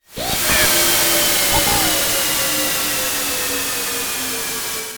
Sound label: The Machine Explodes; Dr. Robotniks Mean Bean Machine; Sega; Megadrive-Genesis